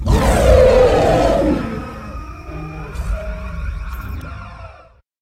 demon dies

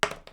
dice; civ13; civ14; Items

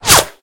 Bullet Whip; Weapons; Guns

